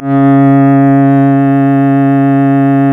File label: C#, strings; Big Fish Audio